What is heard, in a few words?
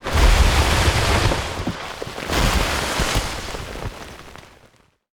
combat atmosphere; World of Tanks